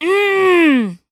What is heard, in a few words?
Angry